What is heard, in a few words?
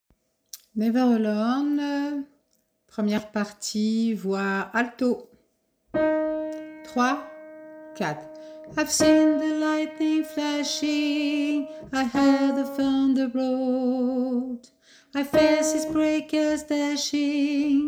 alto